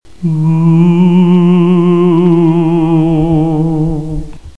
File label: halloween Ghost Sound; Foley